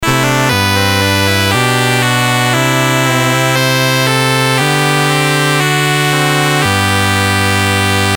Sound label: MOS6581R3, GAMES